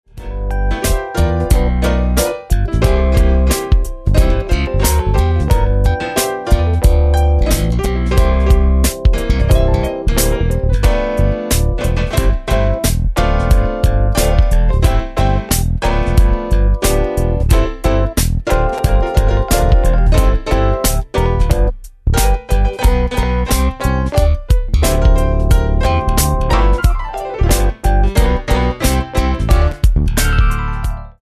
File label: Dueling Keyboards